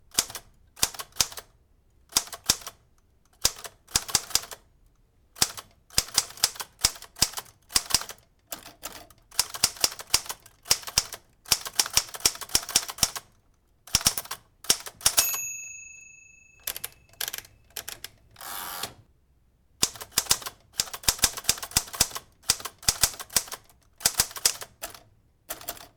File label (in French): Machine A Ecrire